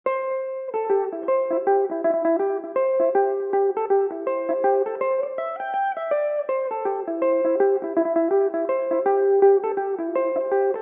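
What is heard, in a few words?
C major belmuse, irish mandolin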